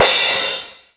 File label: Bass Crash, Jomox Airbase99, Drum Machines